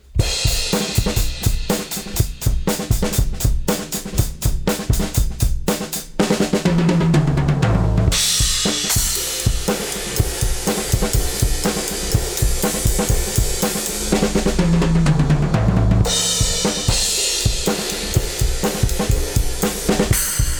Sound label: Bus Processor THRESHOLD 25.0 dB MAKE UP GAIN 8.00 dB STEREO LINK DUAL MONO